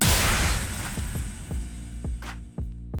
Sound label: UI Enter; bansonic; BANSONIC SFX